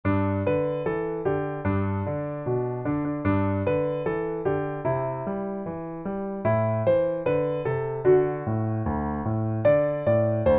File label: jingle bell piano, Instrumental; XMAS